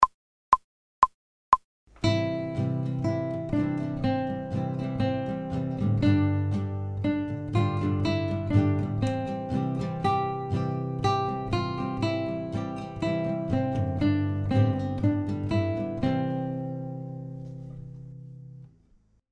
Go Tell Aunt Rhody fast

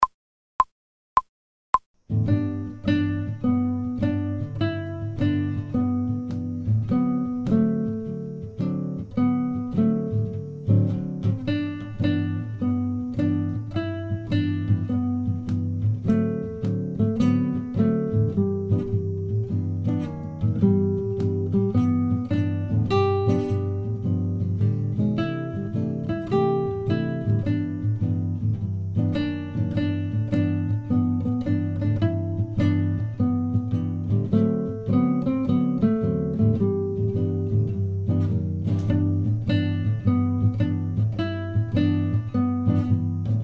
Camptown Races slow, guitar; without metronome

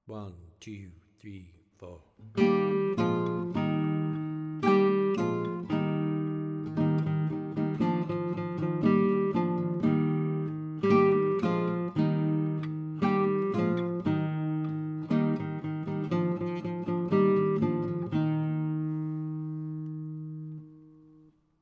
in Eb, guitar; Hot Cross Buns

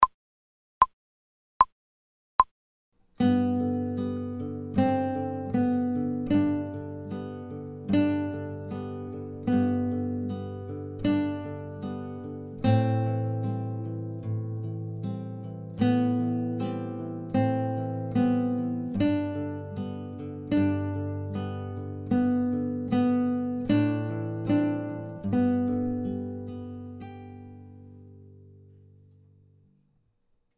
2nd String Review slow, without metronome

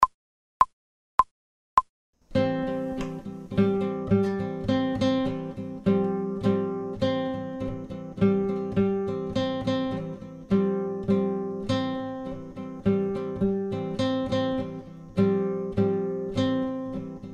Dampening Groove fast

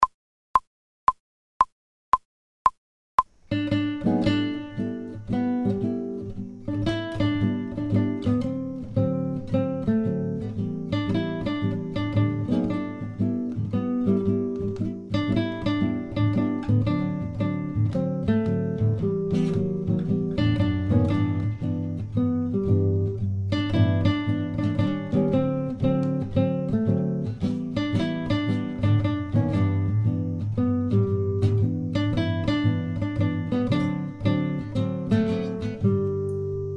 fast, guitar, without metronome

